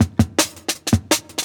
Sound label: 164, Jungle Breaks; 90s Sample CDs; Original Jungle Breaks